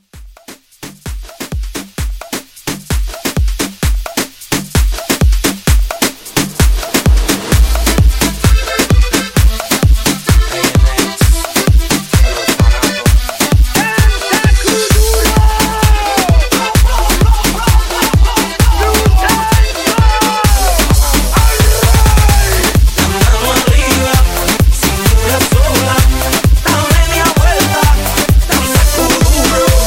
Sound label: Afro Re Drum